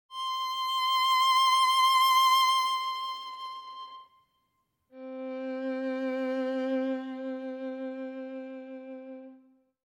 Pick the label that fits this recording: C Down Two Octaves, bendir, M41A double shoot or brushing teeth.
C Down Two Octaves